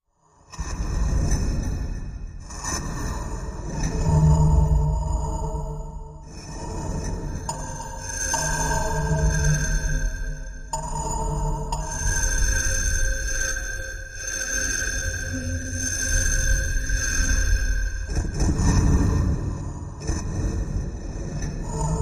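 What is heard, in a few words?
Breath Apparatus Machine; horror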